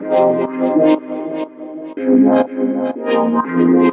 Music Groove 122 Bpm G-

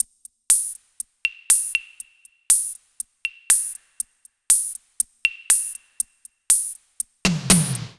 120, Dub Rock, Perc

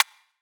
Hat Shot

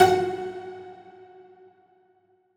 Pizza Synth F-3

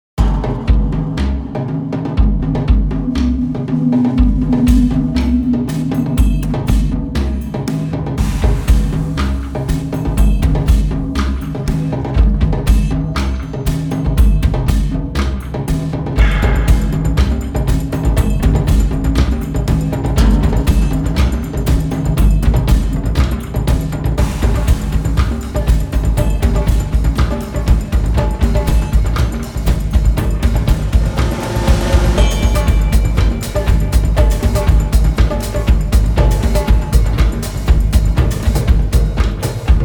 Hybrid Drums And Atmospheric Percussion

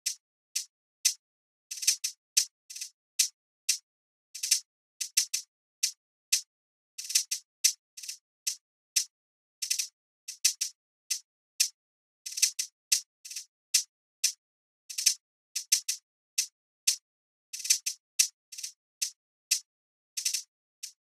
91BPM Bminor Hi-Hat

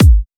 Kick Human; Cymatics

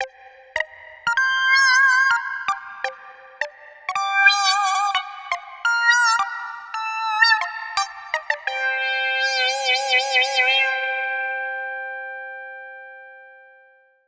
CS80 loop lfo mod mid lick, 85, C min

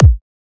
Dynamic Kick

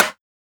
Snare

